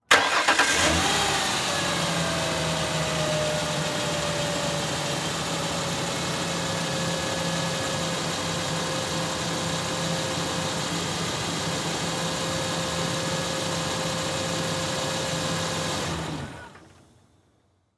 Truck Ford Ranger Start Idle Off Mic In Front On Ground; transportation